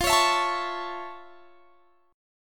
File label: F Augmented Major 7th F; F+M7 strummed; x,20,23,21,22,21